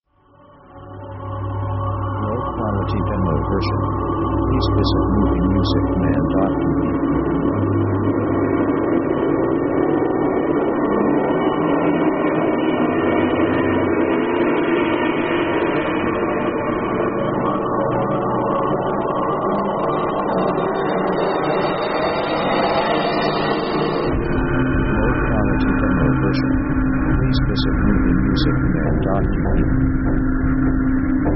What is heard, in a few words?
thriller; synth themes